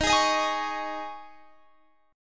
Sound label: D sharp 7th Suspended 4th